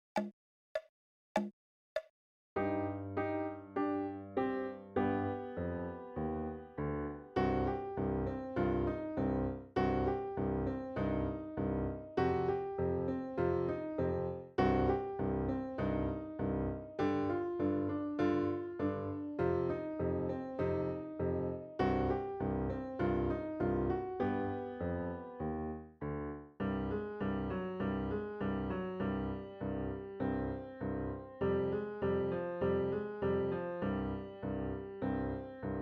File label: Goblin Blues